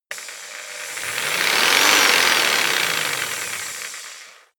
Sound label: jigsaw engine medium fast short; maschinen; saege